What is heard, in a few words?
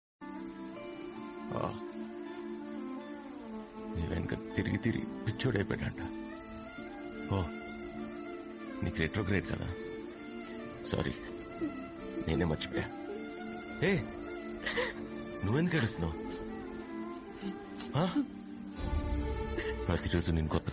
Telugu